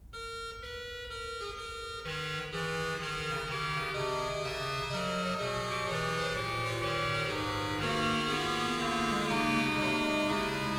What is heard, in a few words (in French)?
orgue; orgue de St-Maximin-en-Provence; Messe pour les Couvents Gloria